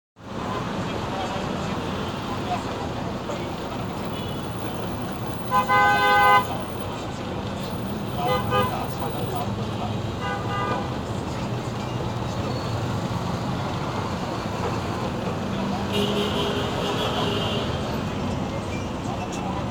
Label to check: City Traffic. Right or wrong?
Right